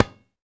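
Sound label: percussion tabla low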